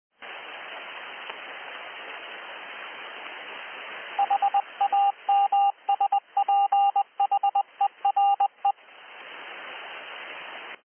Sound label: CW beacon on 7005 kHz; HamSphere